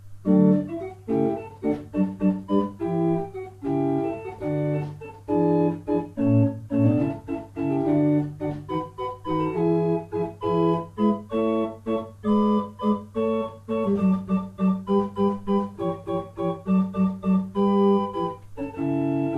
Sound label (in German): Verschueren, gebrauchtorgel